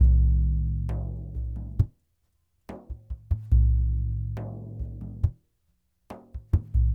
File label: Lg Frame, World Percussion